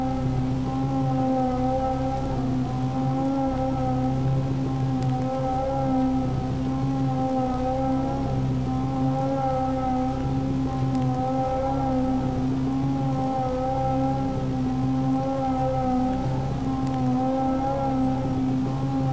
Weird Atmo Synth; Sample Growing Stream 002

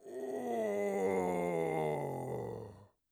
poisoned, orc voice; Orc Voice Pack

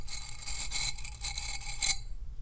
Big Metal Brush, toolbox rummaging

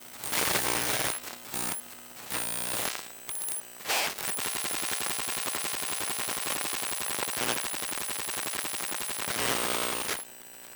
Electromagnetic Ipad Sequence; Mono; Essentials Series NOX SOUND

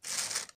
paper

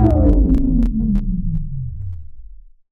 Retro Charge Off Stereo UP

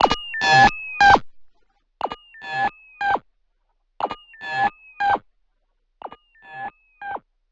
Delay Call; FREE SFX PACK VOL 3

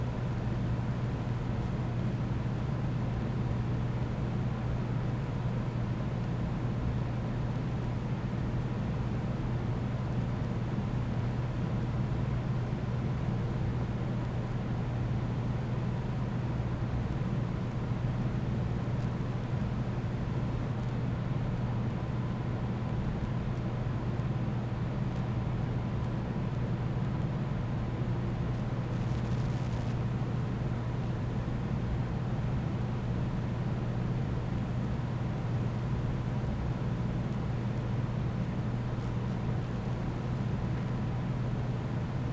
Furnace Fan; Fans and Drones

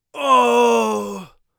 crit, Warrior Voice; Warrior Voice Pack

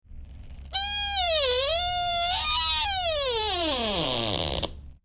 Creaking Door; horror; horror sfx vol 1

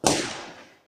cannon; CC0 bang sfx

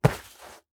Backpack Small Polyester Pick Up Stereo; Essentials Series NOX SOUND; Sample A Sound Effect

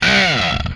hit, vocal; MMRetroArcadeSoundsPack

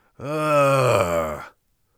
inquisitive, Warrior Voice; Warrior Voice Pack

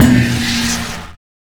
Stab Show; FREE SFX PACK VOL 2

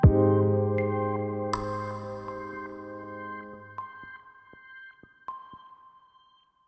Piano and Textures; BB 2HTC Samples Vol 4

